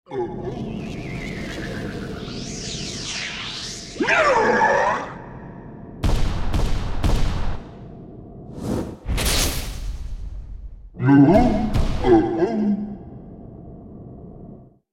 Appear

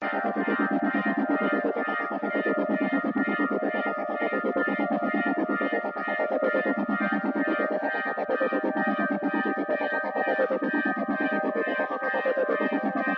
Music FX Step

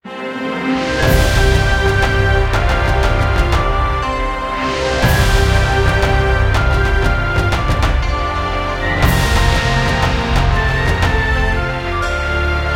Epic Level Start; Global Agenda; Himalaya Epic LevelStart

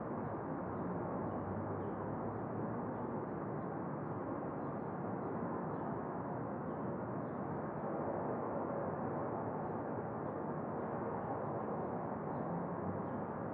amb portal

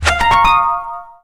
Character Attack

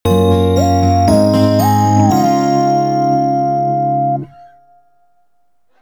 Story Jingle; Love Live SIF2 Miracle Live; android ios

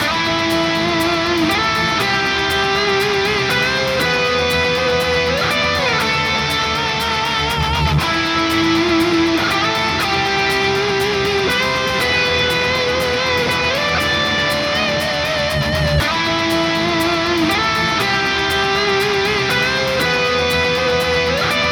Bard Playing Loop Full Groove

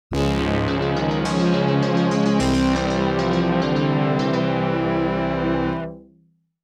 TREASURE BOX; Wanderers from Ys; X68000

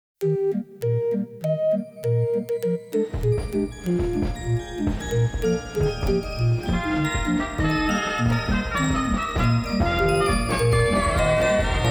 A little melody; Susuki Noharas Concerto 2003

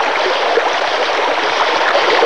Water; Conquer Online 2.0

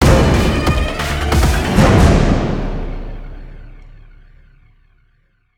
mus action transition; Foreclosed

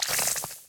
Spitter Spit; super mombo quest

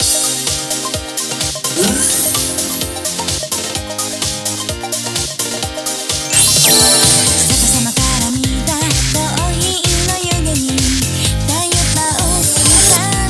BGM Cool; Aikatsu My No.1 Stage; 3ds gamerip

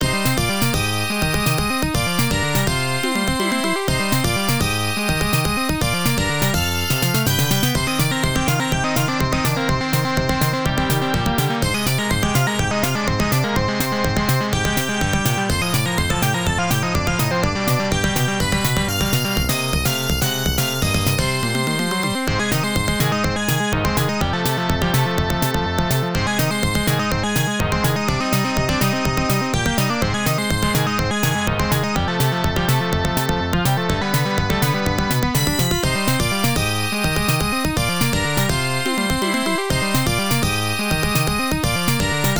Thunderbolt (Stage BGM)

